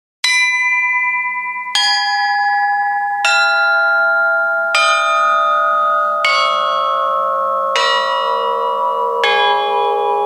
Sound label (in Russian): 7 kolokolov